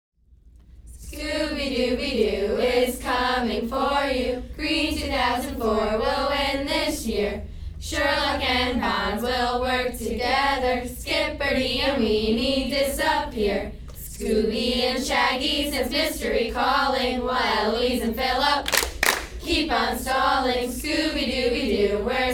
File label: cheers